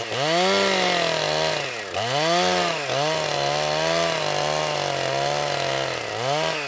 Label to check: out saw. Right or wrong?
Right